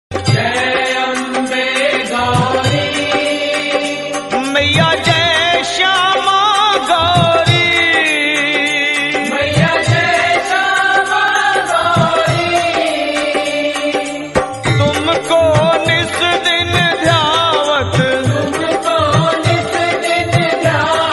Arti, Durga Puja

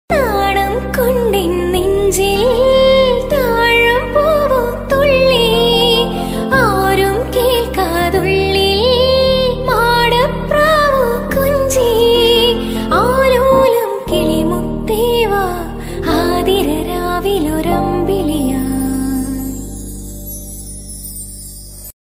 Tamil

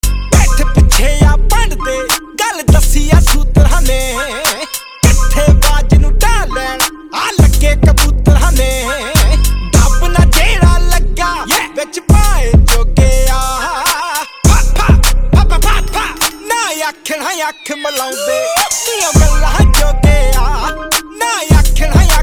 Song

